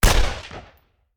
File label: Machine Gun; Sound Ideas; Guns